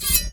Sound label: Drawing Sword Sound